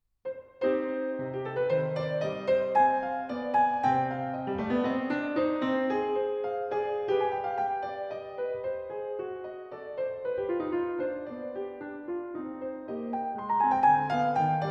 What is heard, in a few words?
Partita no. 2 in C minor, BWV 826 - Courante